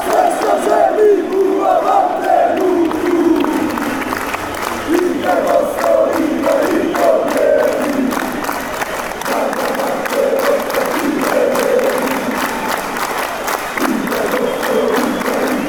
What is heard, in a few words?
bosnia chant